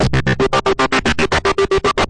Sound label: 114 big pulsing synth; SYNTH AND ELECTRONIC LOOPS